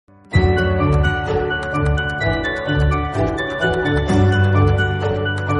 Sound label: no copyright horror bgm